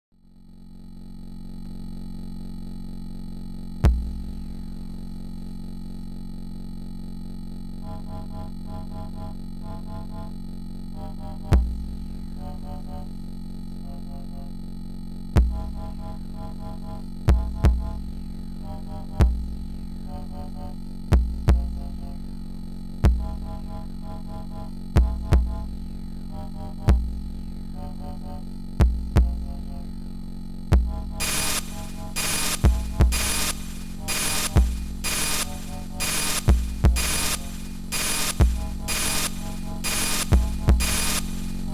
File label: Classic Party Beats